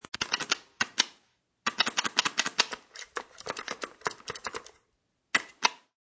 Joystick, Joystick movements and clicks while playing video game; Part of Video Games (Sound Effects:Games)